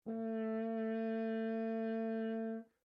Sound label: HORN A4 MF LONG STOPPED, French Horn in F; Alexander French Horn in F; Sound Effects:Musical; Foundation3